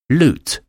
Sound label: lute